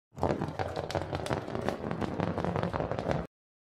tieng lan tron mot do vat

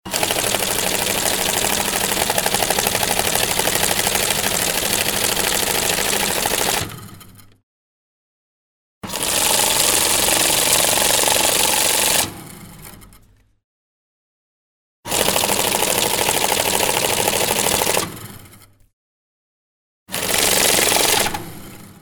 dem tien bang may

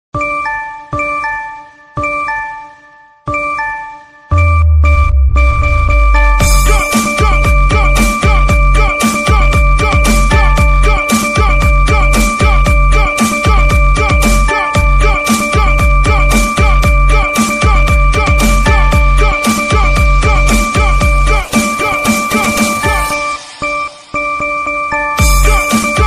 nhạc chuông tin nhắn line remix

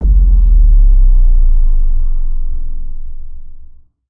Hieu ung am tram 1 tieng ngan

